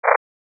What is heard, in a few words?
noise